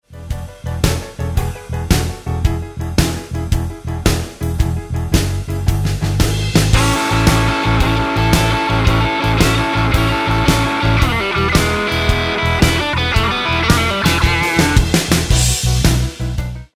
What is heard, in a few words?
Roadhouse Licks Lick, guitar; 20 Roadhouse Licks